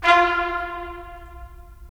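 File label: brass trumpets staccato, right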